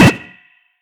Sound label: Hit Ijikeminion; Pac-Man Championship Edition 2 Plus